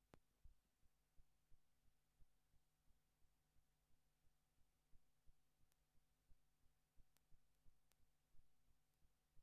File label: Tape Start